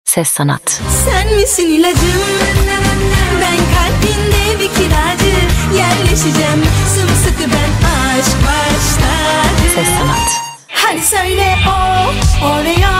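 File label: vocal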